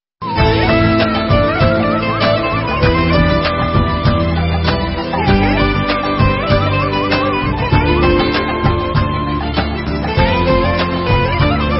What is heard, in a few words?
Instrumental